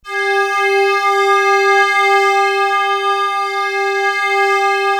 CARP G4, Yamaha VP-1; Music Store; 90's Sample CDs; THE ABYSS

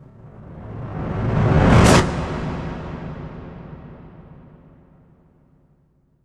IMP WHOOSH

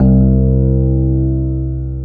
JV SENSITIVE, JV-1080, PIANO; Syntec; Wall of Sounds

